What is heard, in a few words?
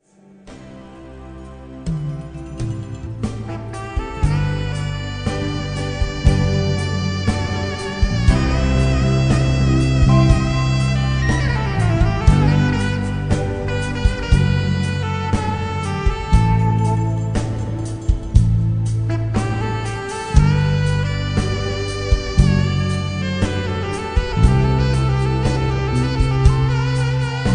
sax